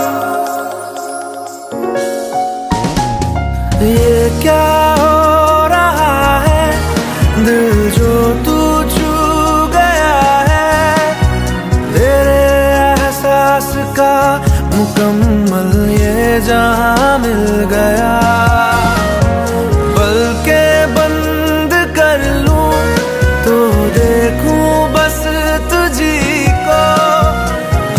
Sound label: bengali ringtone